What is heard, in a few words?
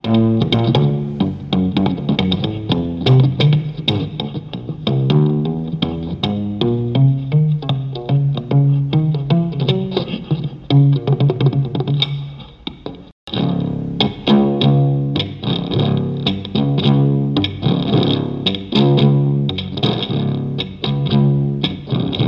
Rubber Band Bass Experimentation